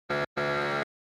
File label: Quiz Buzzer Multi